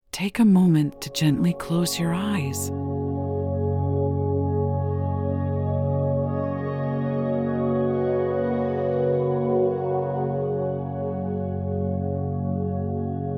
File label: 741Hz Throat Chakra Activation; guided meditation